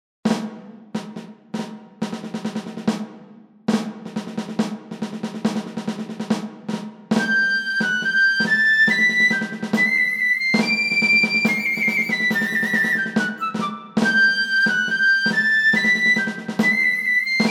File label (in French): marche savoie